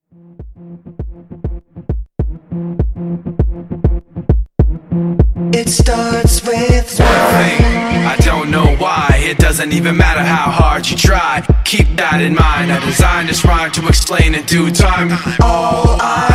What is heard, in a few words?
Dubstep Trap